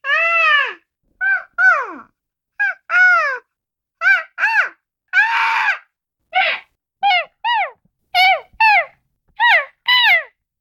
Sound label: Child Pretending To Be A Big Bird